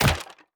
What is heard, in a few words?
Wood